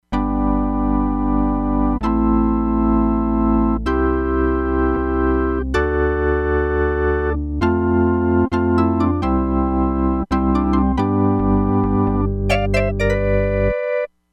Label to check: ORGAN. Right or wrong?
Right